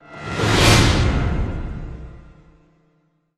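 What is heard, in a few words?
sting, reverse whoosh reverb; transformers war for cybertron gamerip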